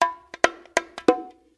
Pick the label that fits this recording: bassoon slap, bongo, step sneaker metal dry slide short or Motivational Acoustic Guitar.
bongo